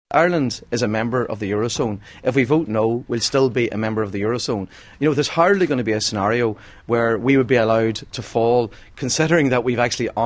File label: pad